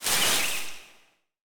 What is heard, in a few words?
Tap